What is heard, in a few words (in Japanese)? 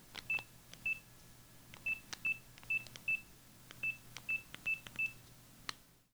denwa; 電話 かける; ピピピとボタンをプッシュする音